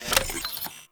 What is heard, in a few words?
Shotgun Reload, Weapons; Warframe; Corpus